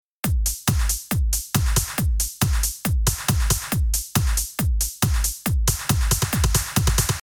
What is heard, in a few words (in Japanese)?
ベロシティ変化なし